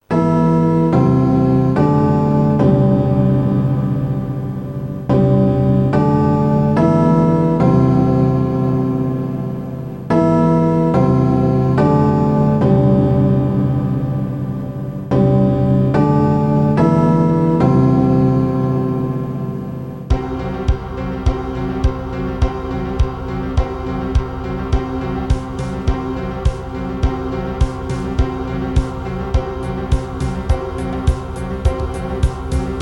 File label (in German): zirkuslieder